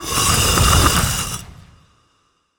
ambient game movement stone tile button; esmteam

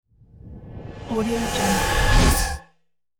eerie synth tone

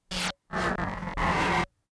video static distortion short bursts

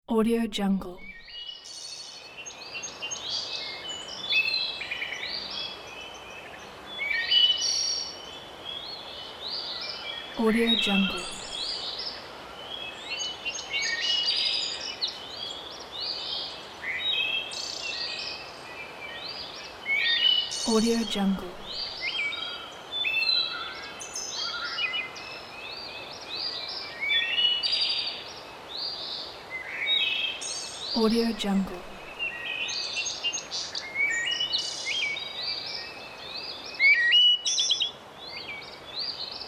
morning forest

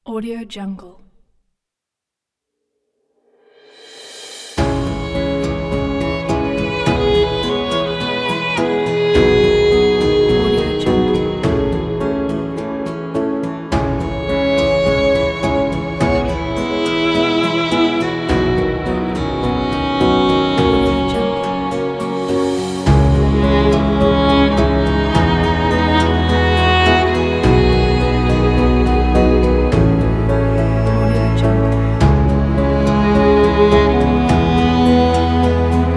cinema score pack

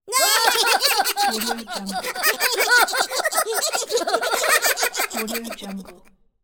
three cartoon characters laughing

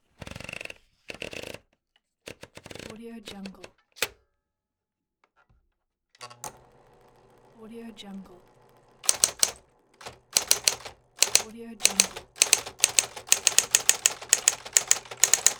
typewriter electric vintage

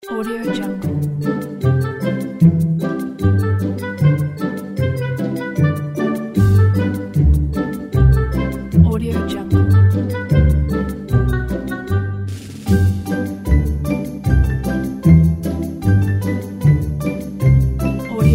fun tutorials children

